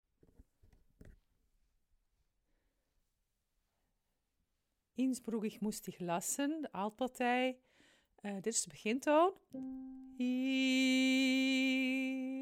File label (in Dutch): alt, koorleden